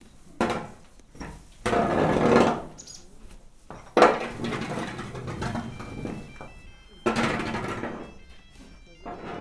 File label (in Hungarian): lo citrom lapattal osszehuzasa; állathangok; paraszt udvar